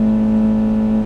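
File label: muffled short distant engine sound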